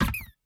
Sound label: Hammer Kick; game audio; Mita; MiSide